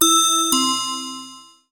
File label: Samsung S20 Notification Ringtone